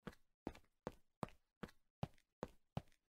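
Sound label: shagi po zolotu, steps on gold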